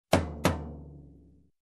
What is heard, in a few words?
Hard Middle Tom Hit Twice